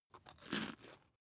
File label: bite biscuit